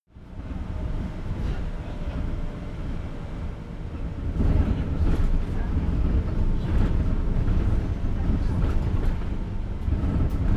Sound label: bus drive rattle interior